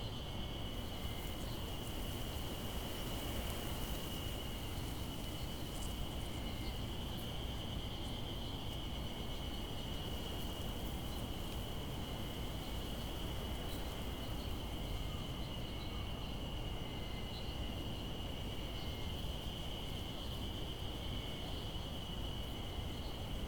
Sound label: indoor road day cicadas; anemoi